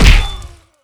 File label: punch strong